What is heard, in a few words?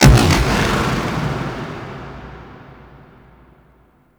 Ship Warp Boom; Liset; Warframe; Guild; Capture Objective Room